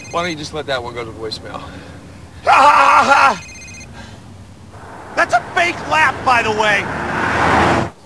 fakelaugh; The Hangover

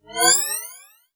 Alien takeoff

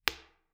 Pool ball

